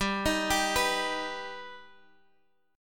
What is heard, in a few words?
B Augmented G, guitar